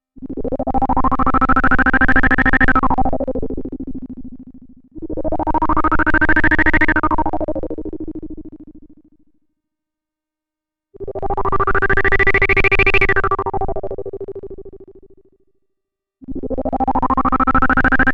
Roland SH-101

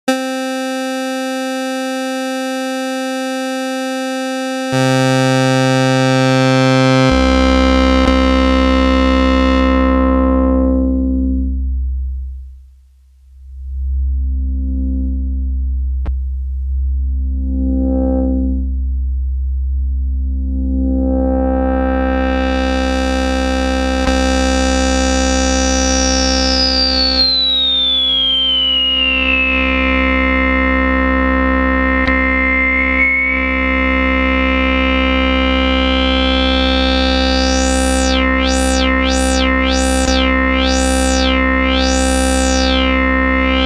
Square PWM Dist LFO Bass LPF

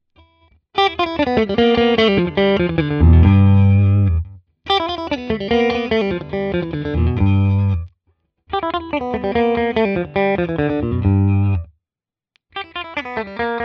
AZS clean all positions Bluegrass

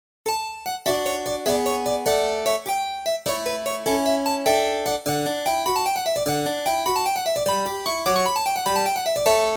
cembalo

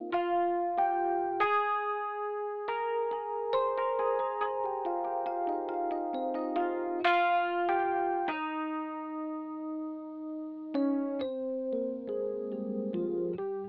rhodes OUT Oeksound Soothe2

